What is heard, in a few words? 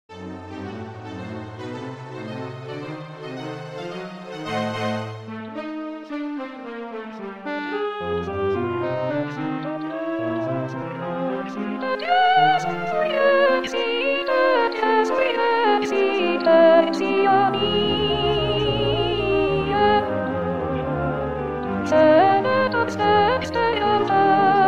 Soprano; Puccini; Messa; Credo; resurrexit